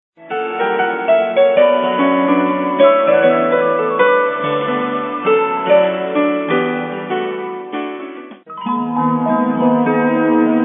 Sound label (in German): Ambiente